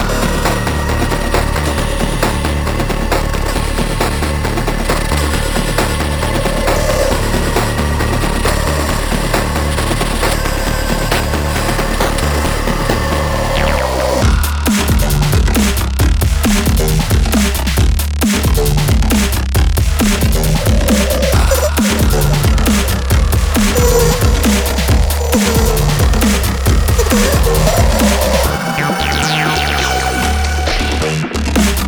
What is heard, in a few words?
Hollow Strings; Souls Remnant